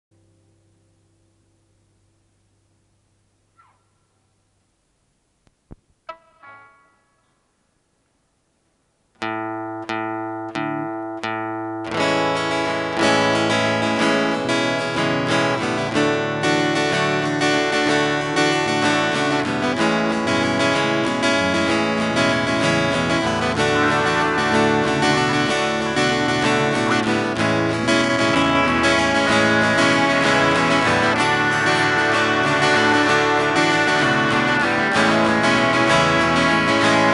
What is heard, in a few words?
Lullaby acoustic with bass